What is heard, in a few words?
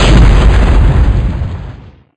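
grenade explode, weapons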